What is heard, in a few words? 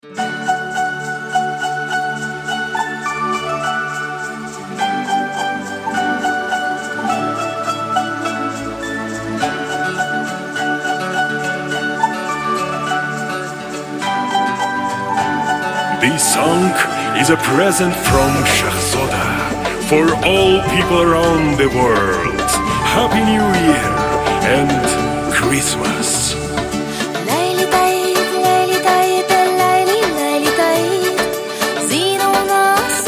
jingle bells; arabcha